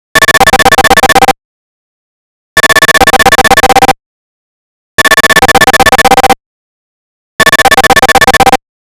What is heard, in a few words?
intra call